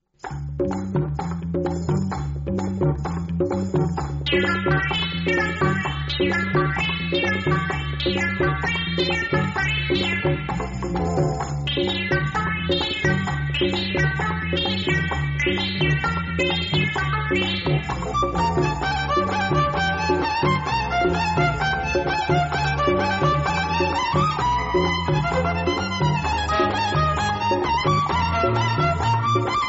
Theme Instrumental; Wanted

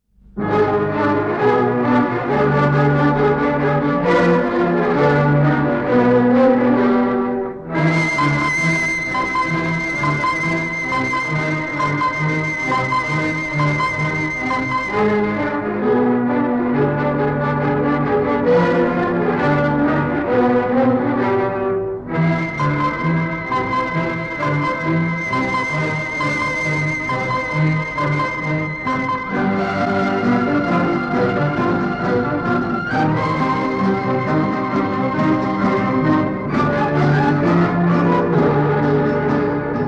Music

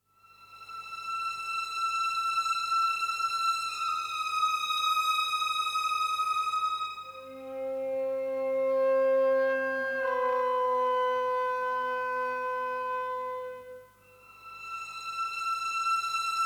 Sad Version; hukumat 1987

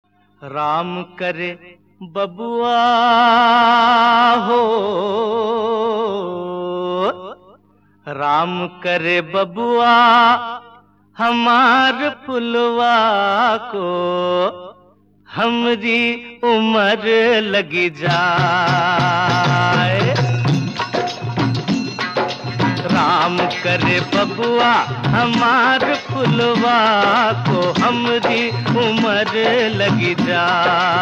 With Dialogue; anuraag 1972

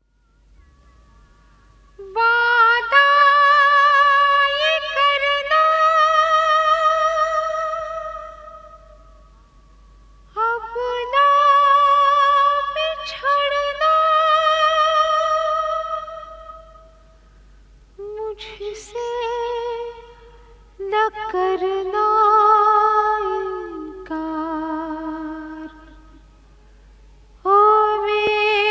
Female Version; aakhri chetawani 1993

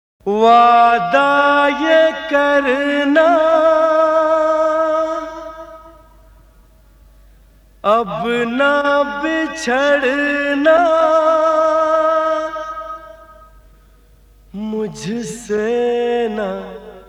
Male Version; aakhri chetawani 1993

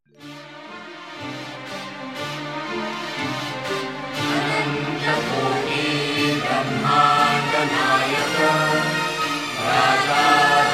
Sloka; shirdi sai baba 2001

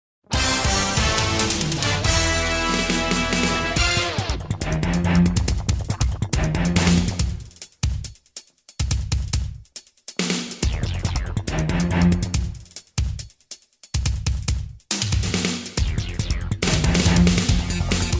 Theme Music Instrumental; duplicate 1998

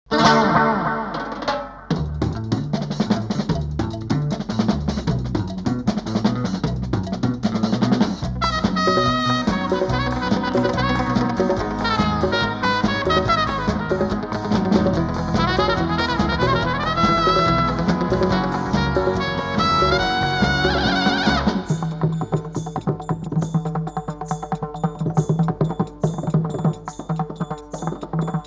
Instrumental; zindgaani 1986

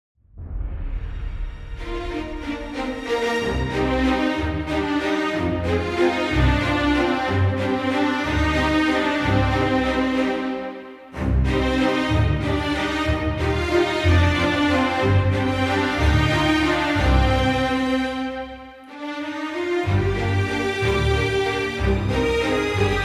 Waltz; chopsticks 2019

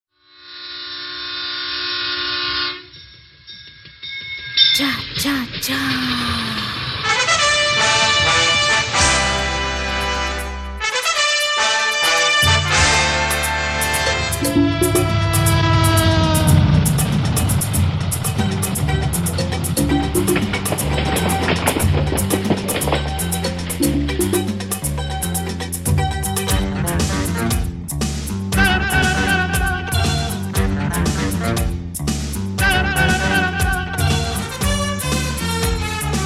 Train Theme Instrumental

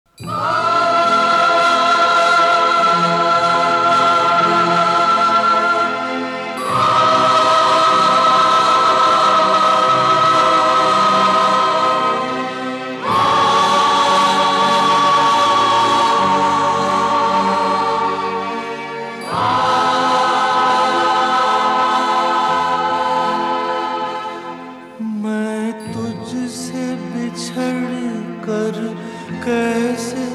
Sad Version; bhagwaan dada 1986

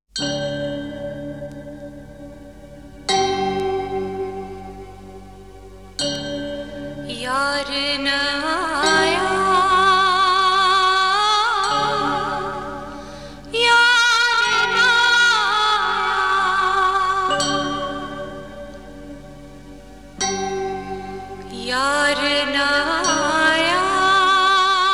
Female Version

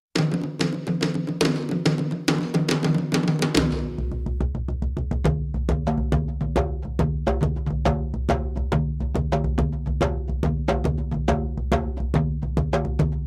Dance Version Instrumental

